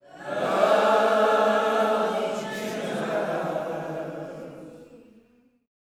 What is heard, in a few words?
LOVE JESUS, Gospel